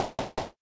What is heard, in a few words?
build; adarkroom